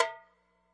classic hip hop Tab; STYLE KITS (Hip Hop, Etc); Classic Hip Hop Mega Kit

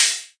crunchy kit crash; STYLE KITS (Hip Hop, Etc)

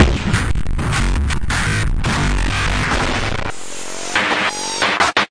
destructo distorto break; Electro and Synthetic

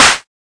melbourne bounce Clap; STYLE KITS (Hip Hop, Etc); D3ron Melbourne Bounce Kit

